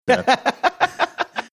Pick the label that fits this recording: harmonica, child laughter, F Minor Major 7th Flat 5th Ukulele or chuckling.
chuckling